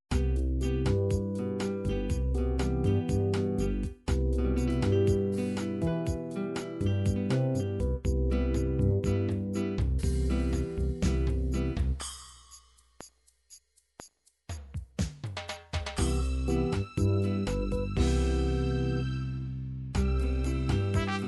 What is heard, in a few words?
bossa nova, midi